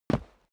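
step boots single concrete single stair up, footsteps; schritte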